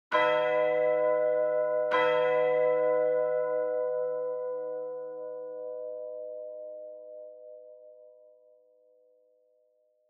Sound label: Church Bell, Low Mid